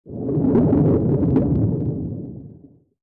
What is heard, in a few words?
Blubb; Barotraumatic; Hornshrimp